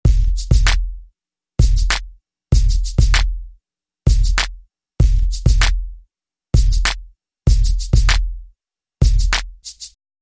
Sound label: Drum Loop